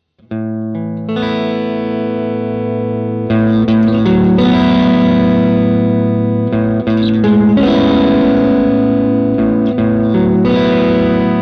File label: SPECIAL CRANKER Si Chords